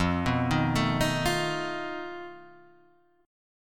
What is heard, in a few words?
B 7th Flat 5th F, guitar chord